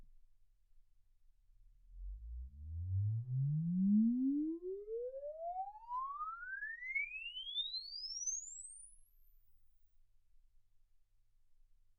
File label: exp swept sinus 10Hz 24kHz